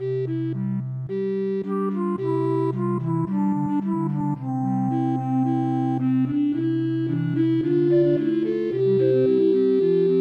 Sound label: Recorder Quartet; migratory bird